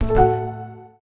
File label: notification